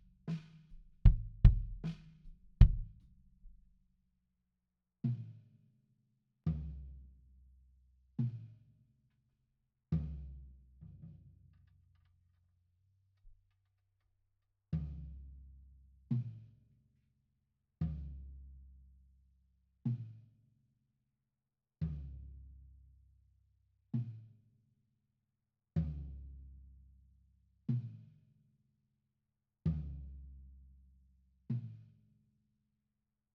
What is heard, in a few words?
BD IN